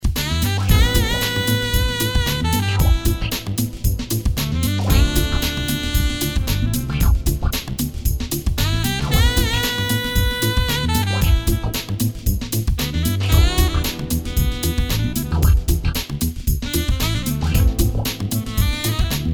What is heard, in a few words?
Pop Fusion Jazz Instrumental Acid Groove